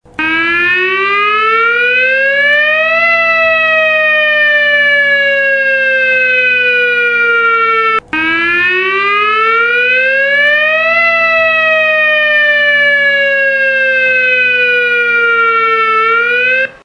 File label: wail Tone